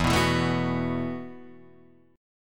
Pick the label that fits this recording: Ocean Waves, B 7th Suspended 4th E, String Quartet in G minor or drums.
B 7th Suspended 4th E